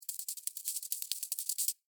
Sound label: Beetle Walk Sand, Animal, Beetle, Walk, Sand, Insect, Bug; Beetles